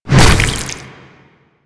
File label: Tentacle Swing Hit; Enemies; Abilities; Warframe; Proto Infested Ancient